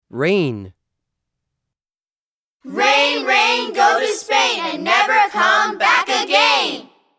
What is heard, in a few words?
Rain